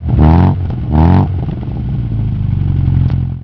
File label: Sprite, classic car